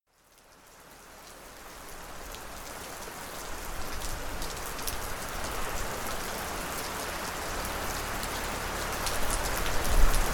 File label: rain in mountains looping